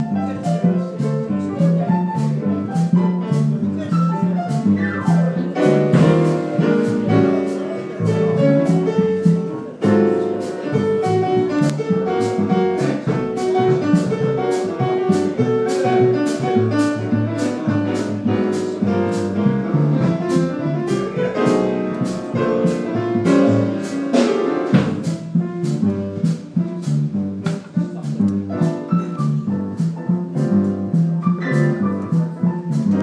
jazz

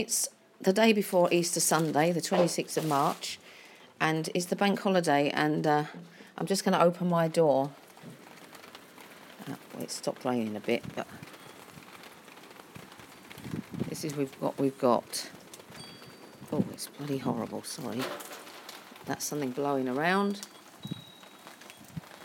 English weather

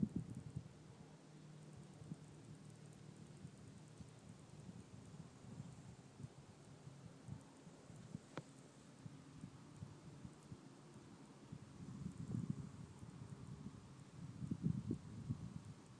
quiet saturday afternoon

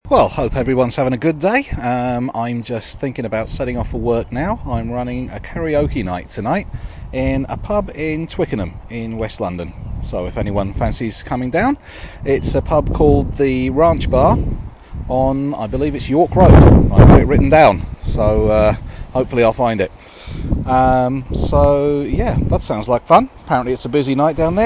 karaoke

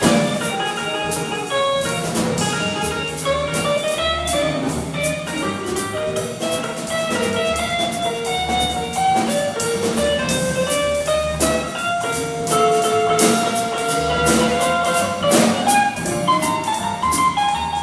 jazz ensemble

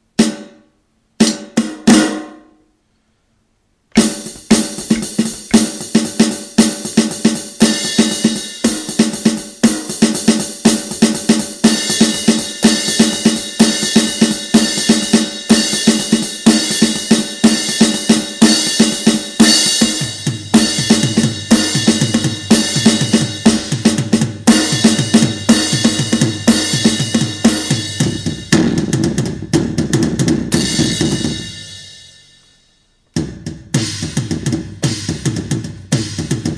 drums

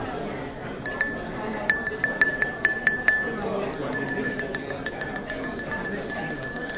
glass flicking; at ndi09

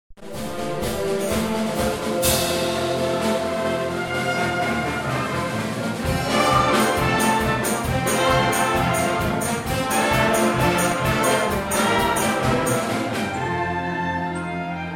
concert band instant concert last part

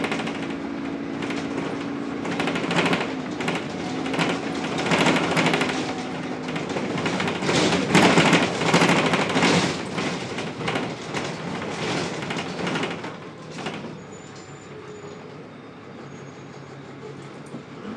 shoogly bus noise rattling windows

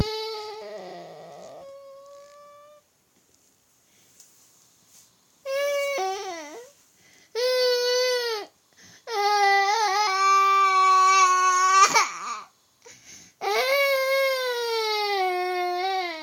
ugh

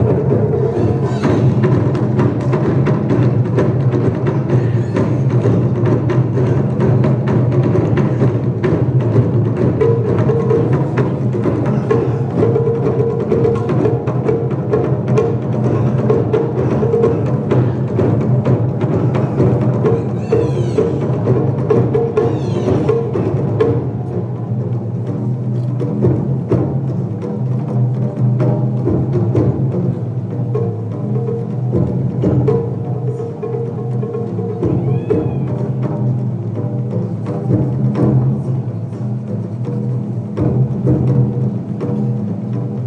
sitting near floating Japanese drummers

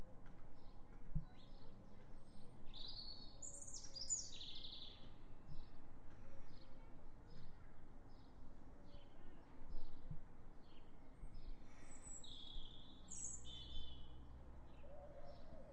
short birdsong burst distant bird that echoes

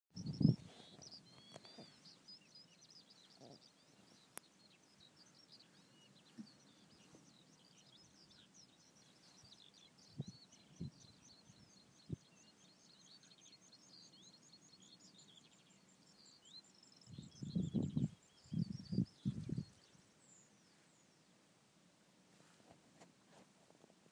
song thrush

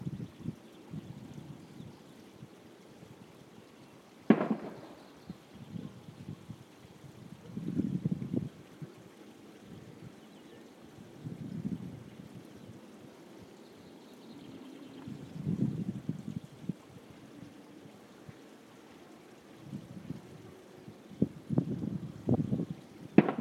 bird scarer

